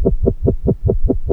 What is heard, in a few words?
Korg, FX